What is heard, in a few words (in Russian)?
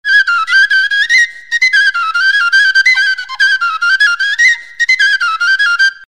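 сопилка сопель дудка свирель короткая мелодия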